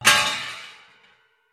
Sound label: hit bbq; Gumball - Go Long!